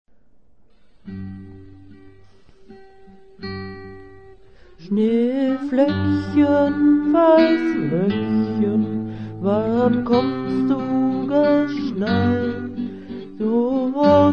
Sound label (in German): schneefloeckchen gesungen